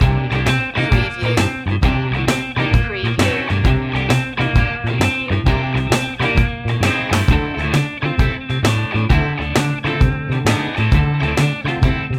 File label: boogie loop